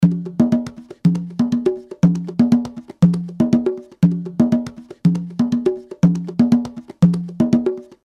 Conga Groove; Apple GarageBand 2; Apple Loops

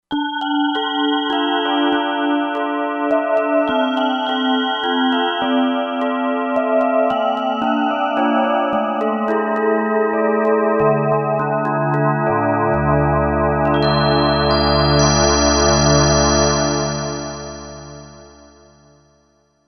Bellz

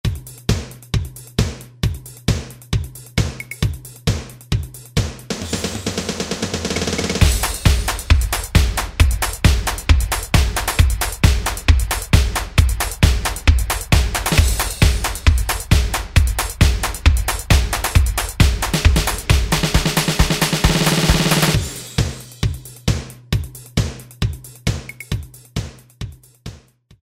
Tekno Sequencer, Alesis SR-16

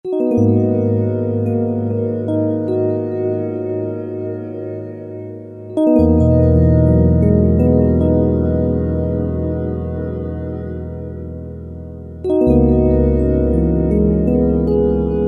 Ambient

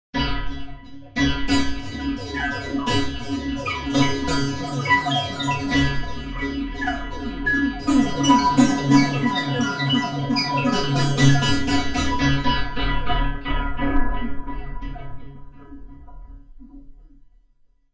Talking M1, Korg MSC-1S; Korg MSC-1S Variety

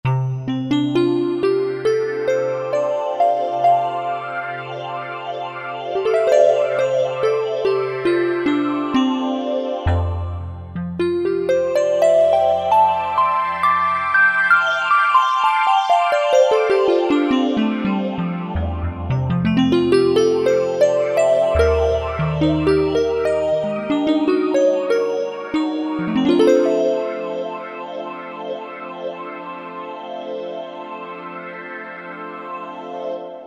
Harp, Korg Wavestation A-D

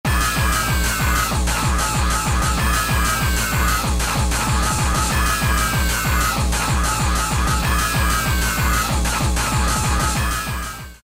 Gabba, Roland D2

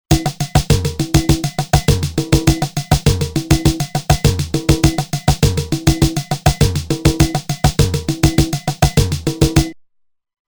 Samba Fill In, Roland TR-505; Factory Rhythms

